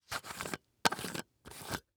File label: Household Jar Unscrew Lid Plastic